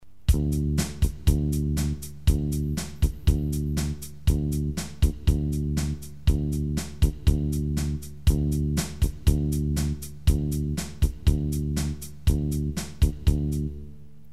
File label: beat; Nelijakoinen; back beat